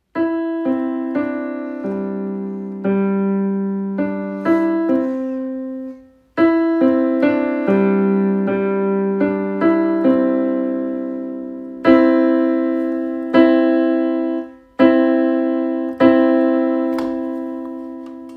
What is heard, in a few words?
Bell Tower Home Recorded